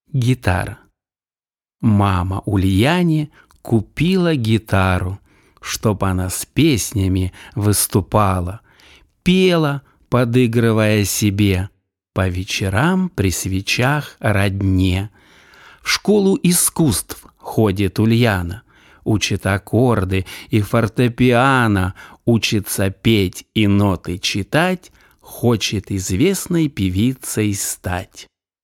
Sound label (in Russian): Gitara